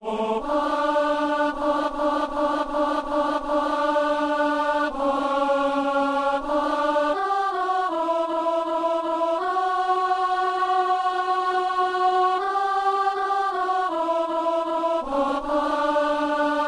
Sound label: alto; Saint-Denis; Marie, témoin d'une espérance